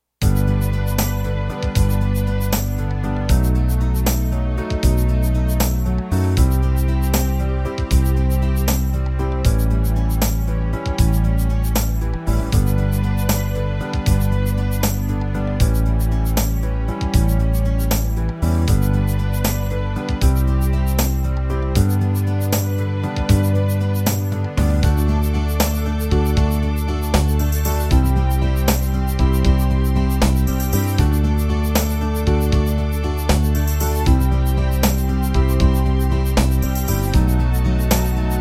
12-8 Ballad

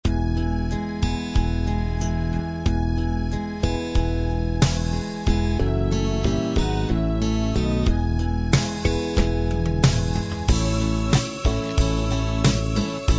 ballad

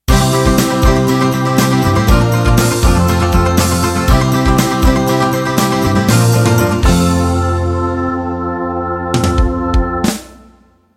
Country 16Beat End

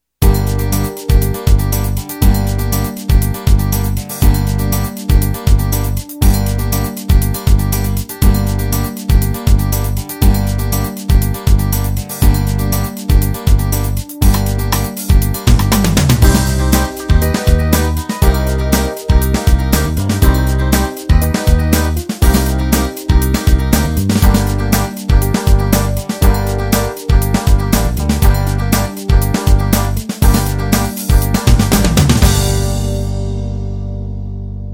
6 string beat

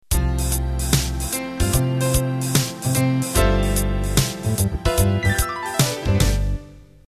Swing Rock Intro

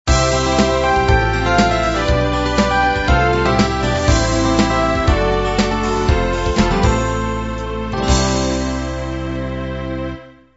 soft beat end

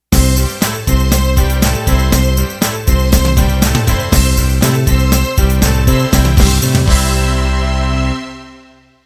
Motown End

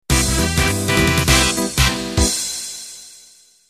Quickstep end

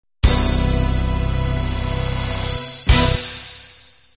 guitar ballad end

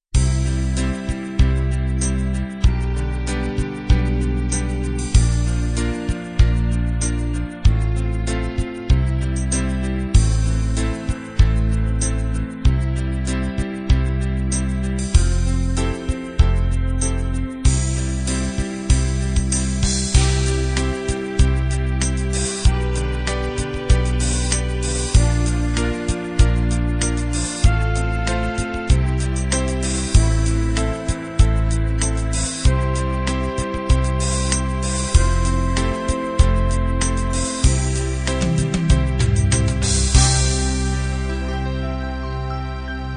love ballad

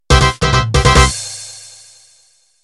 Quebradita End

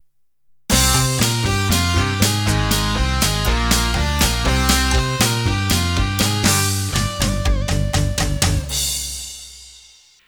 Open Rock Intro; Intro #2

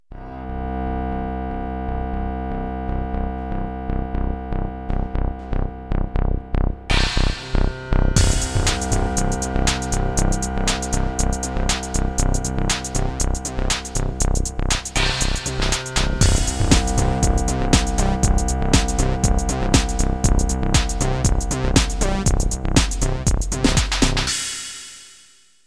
Progressive Intro

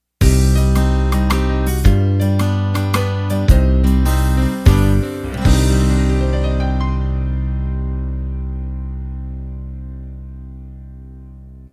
Country 3-4 End

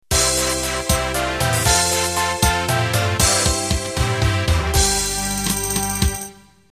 12/8 Pop Intro

